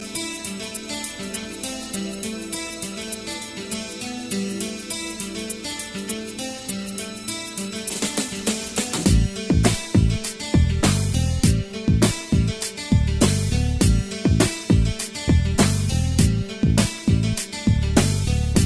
Telugu

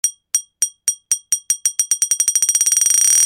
Bottle Hit Speed Up

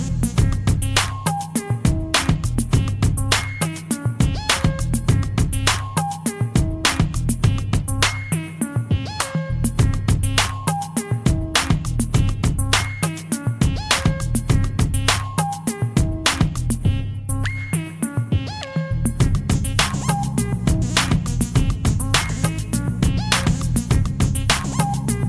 Euro Party

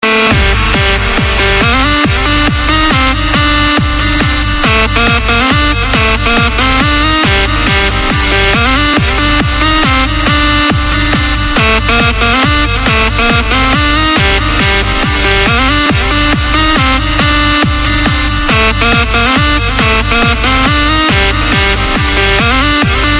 trance Energy

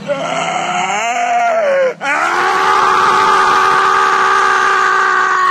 Screaming couple Guy

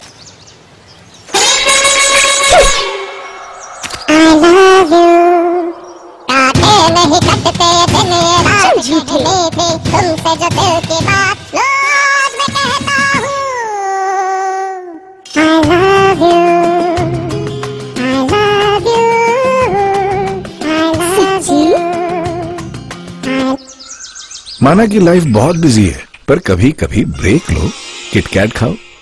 Squirrel Singing

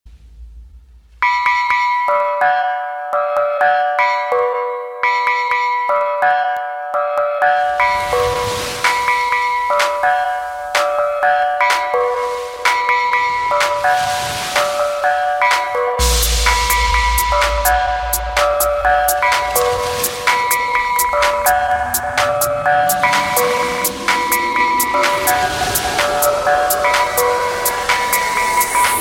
Sunken Bells; Ibiza

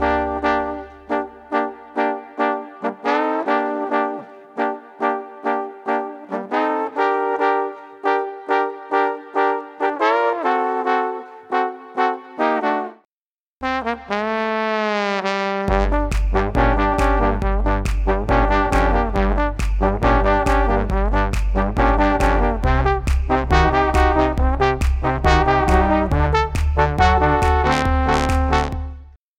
Trombone

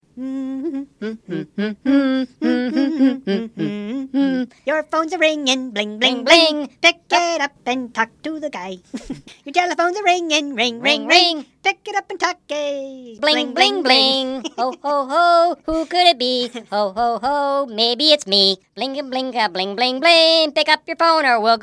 Ho Ho Ho Song

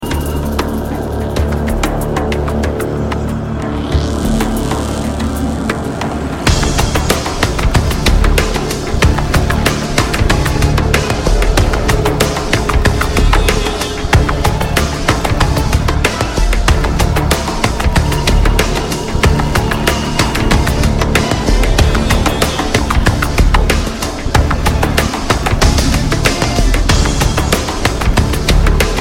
Jungle Music; Igi 2

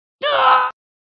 Death Noise; Lego Luke

